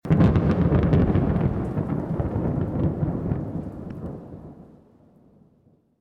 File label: thunder; Funkin